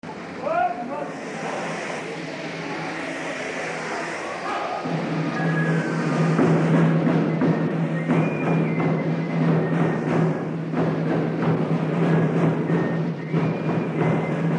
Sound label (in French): fifre